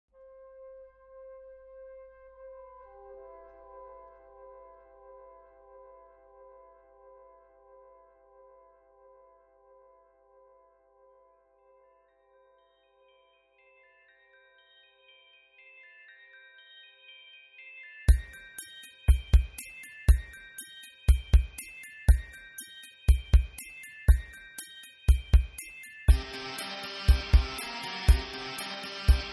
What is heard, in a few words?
unhappy tune ad muzak